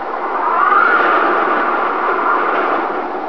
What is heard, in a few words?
Wind; MatrixHall